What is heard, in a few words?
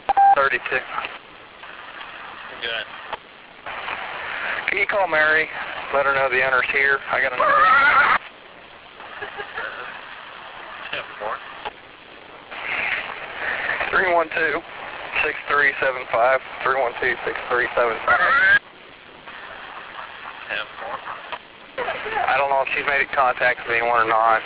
horse; Medic 10